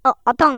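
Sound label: child Hurt, Chinese; 人聲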